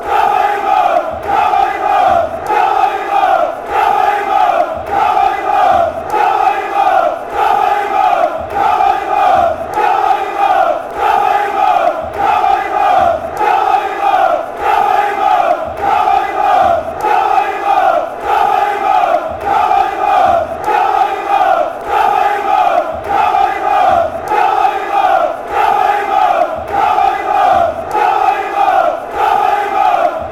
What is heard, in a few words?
anderlecht chant